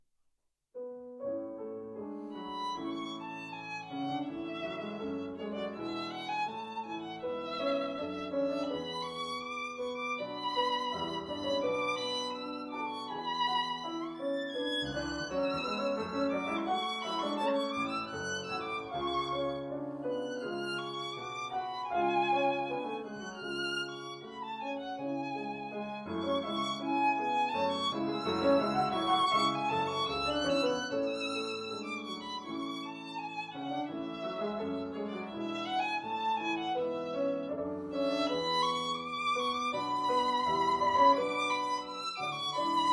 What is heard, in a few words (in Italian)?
Allegretto poco mosso; Franck Vierne